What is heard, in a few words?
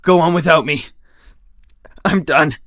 hurt near death; Escape from Tarkov; usec2